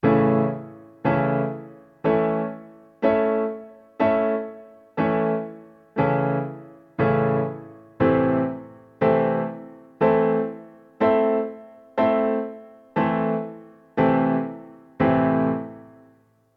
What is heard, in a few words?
triad and inversion block chord in A and Am